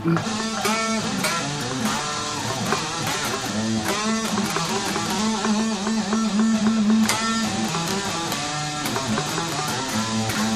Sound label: guitar jam